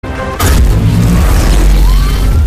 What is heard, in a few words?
EMP Impact; NFS HP